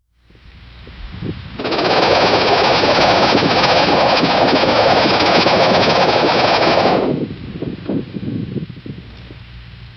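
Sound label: fading desperate throbbing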